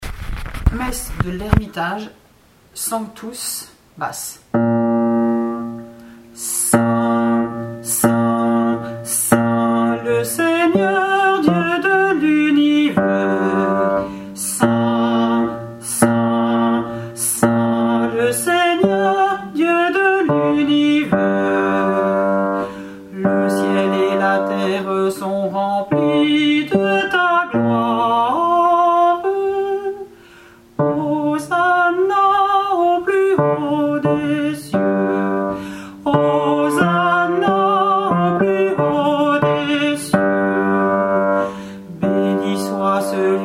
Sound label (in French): BASSE